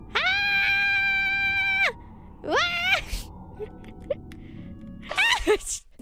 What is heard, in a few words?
Scream AAAAAAAAH UWAAAAAAA; IRyScream